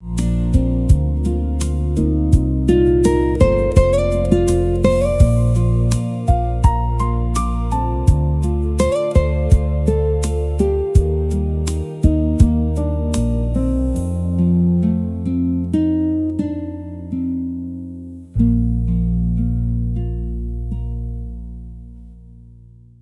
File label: loop; bgm; education